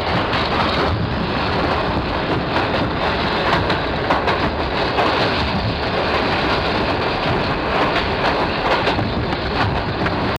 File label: RUN, Train, R62 Kawasaki; OpenBVE